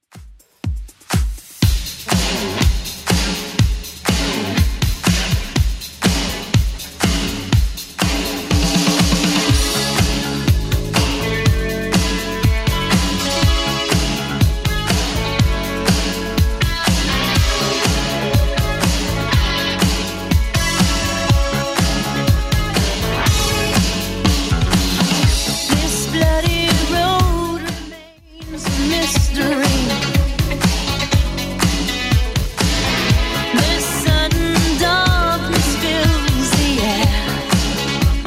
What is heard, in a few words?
80s Club 122 bpm CLEAN; remixplanet